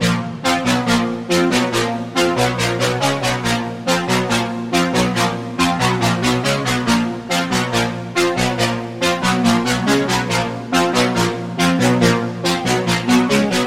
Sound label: Lead; Routed Sound; Serum 2 Expansion - Noble Gangster Vol.1